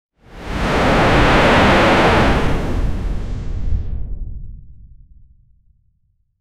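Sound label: dramatic whoosh water splash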